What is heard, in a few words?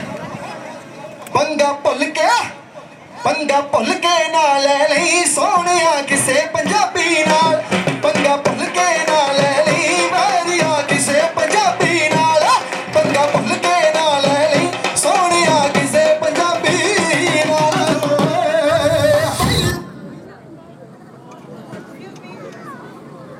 bhangra; norwood green